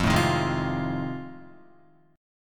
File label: F 7th Sharp 9th, Guitar; strummed